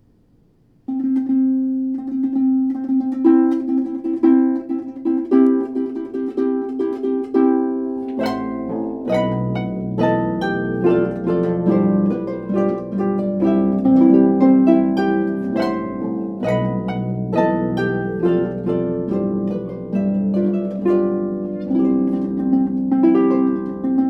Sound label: Wedding March